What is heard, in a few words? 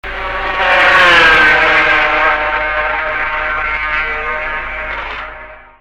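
MZ 250, motorcycle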